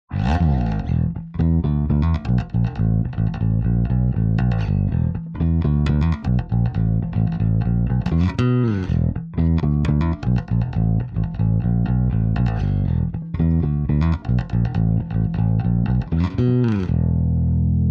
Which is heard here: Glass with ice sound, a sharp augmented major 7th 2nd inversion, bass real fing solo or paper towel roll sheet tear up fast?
bass real fing solo